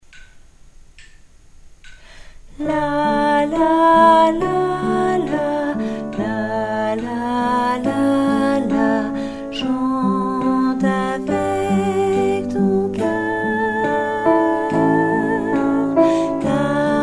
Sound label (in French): basse